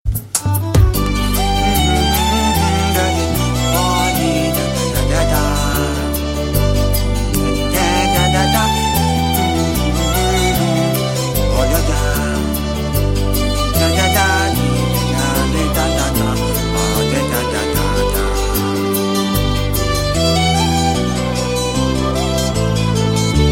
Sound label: Soft Music